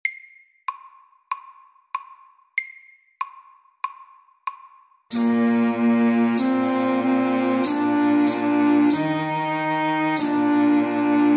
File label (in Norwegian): Folketone SLOW 95